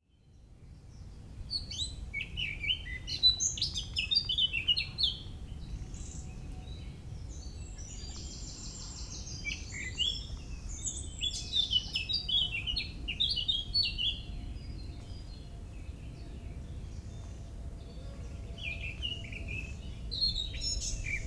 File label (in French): oiseaux